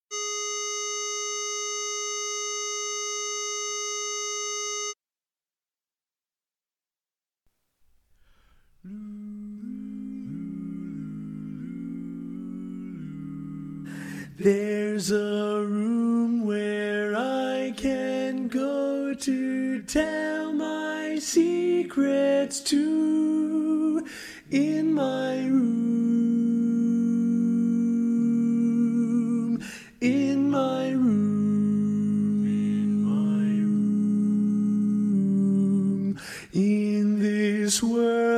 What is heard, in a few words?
lead